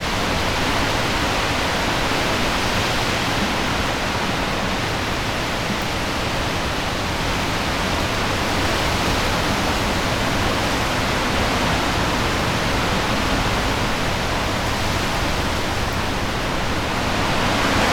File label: Wind Trees